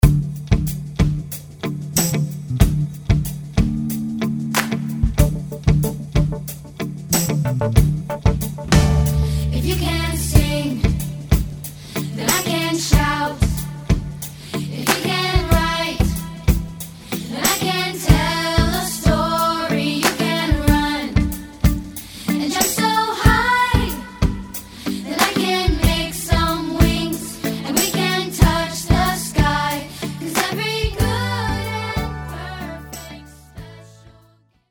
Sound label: Good Gifts Contemporary Vocal